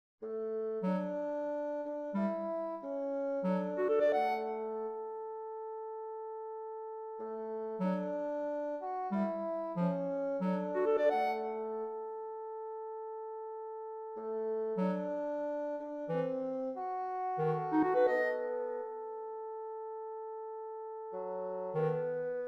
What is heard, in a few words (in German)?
Klarinette